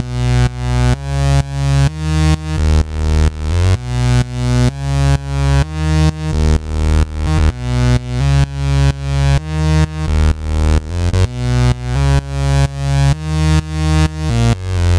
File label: bass, Key F#, Bpm 128, Big Room House; Loop Nerds; Big Room House Allstarz